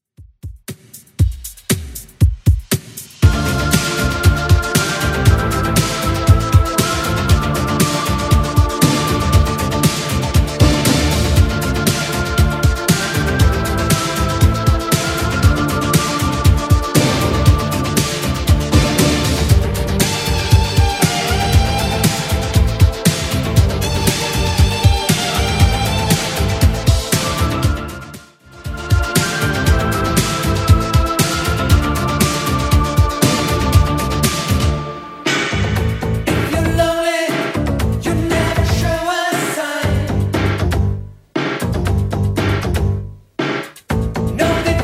80s Redrum 118bpm

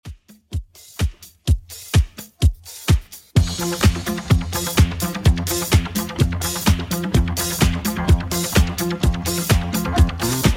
70s Redrum 127bpm; remixplanet

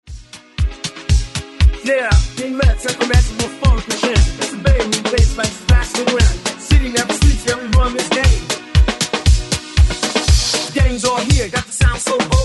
80s Redrum 117 Bpm; DJDOLLS

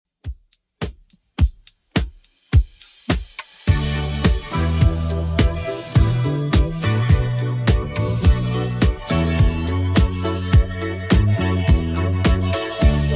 70s Redrum 105bpm

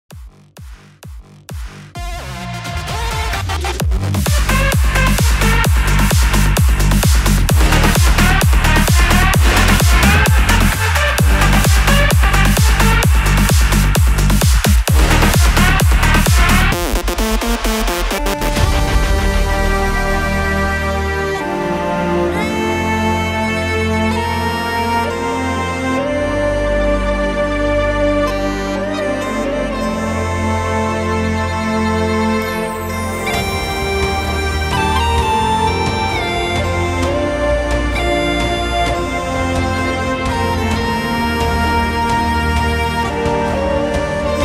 VIP CUT 130 BPM

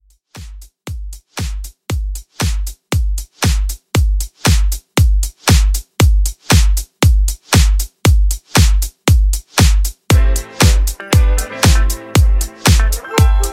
80s Redrum

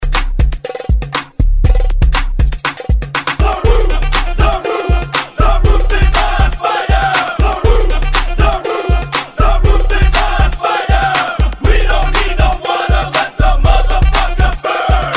Mashup Redrum

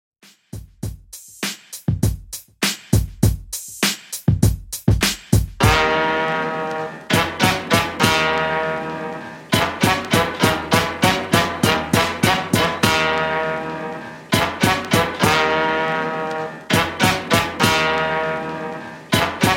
Old School 100Bpm; Redrum

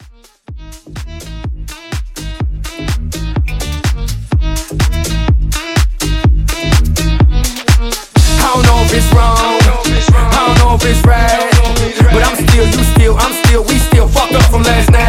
Club, 125

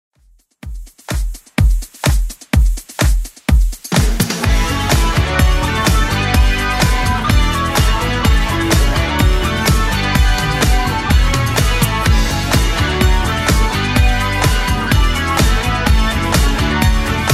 80s Club 126bpm

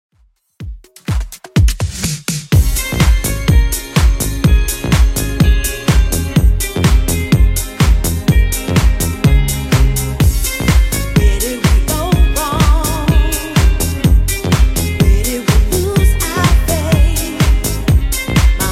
90s DJ Friendly Redrum 125bpm

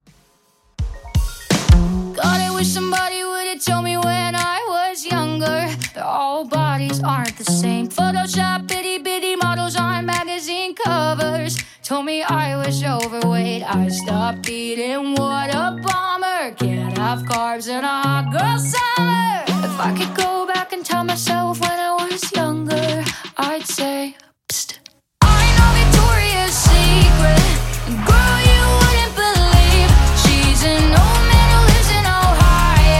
Dj Intro Outro 83Bpm

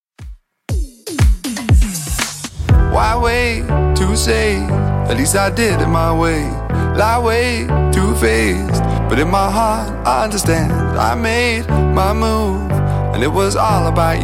2ks Redrum 120bpm

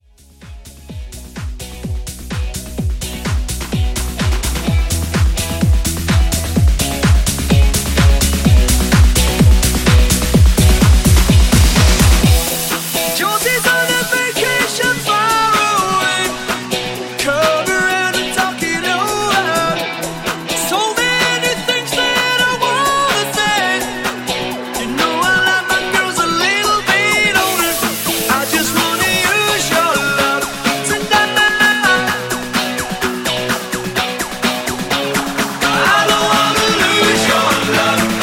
Tonite Funky House Mix; Remixfreakz

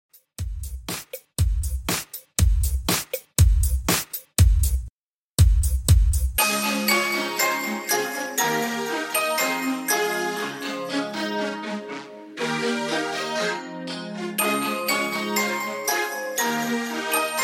90s Dance, 120Bpm; Redrum

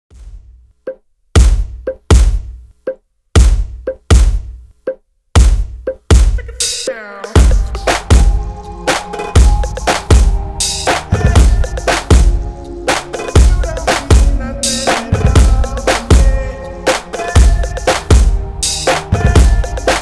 redrum 120 Bpm

